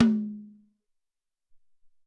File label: Hi Tom, Alesis SR-18